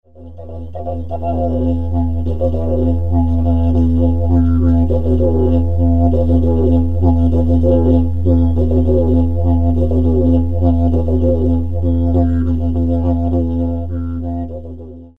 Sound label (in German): Didge in der Kiste; selbstgemacht